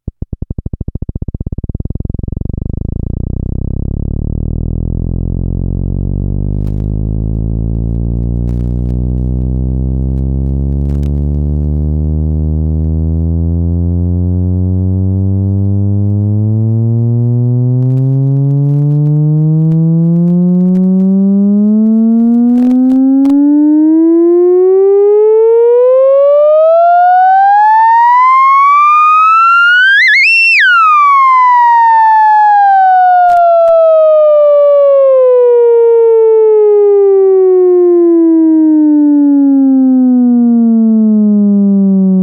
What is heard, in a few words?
moog melodia